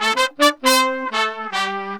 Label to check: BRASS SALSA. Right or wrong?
Right